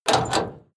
lock metal door

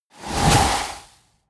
whoosh

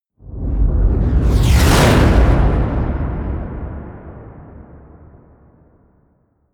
flyby

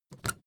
suitcase lift up

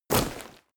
step leather light

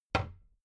cabinet door close soft

